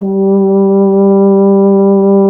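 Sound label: BRS F.HRN, Solo Instruments, French Horn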